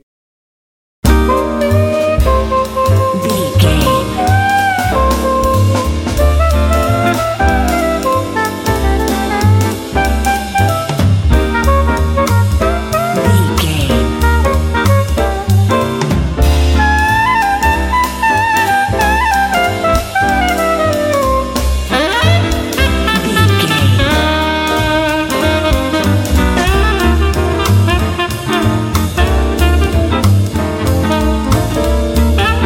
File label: jazz walk through city